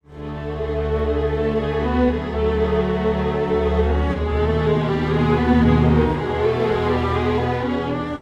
strings, timestretch

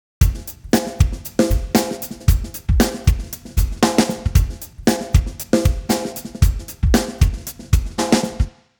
drums 90; timestretch; ikm sample tank stretch

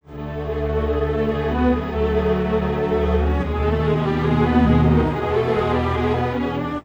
strings; timestretch; izotope radius

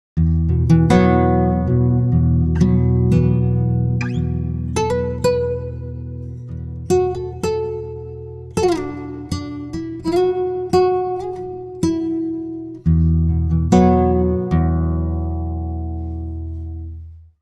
pitchshift guitar, Apple Logic Pro complex -5

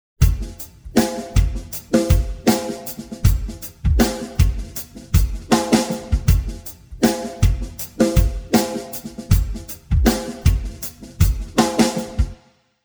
drums 133; timestretch; serato pitch n time pro

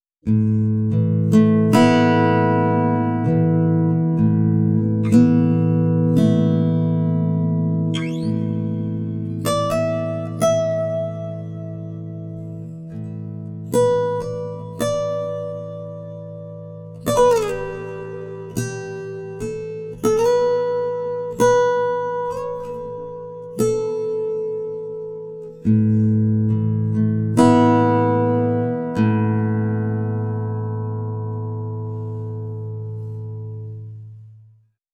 timestretch guitar

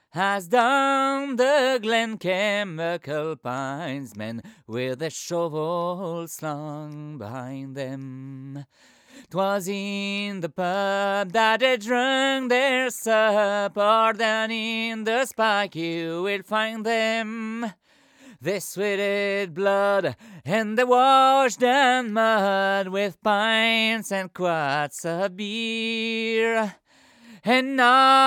voix c414; audient mico

